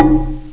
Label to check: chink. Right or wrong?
Right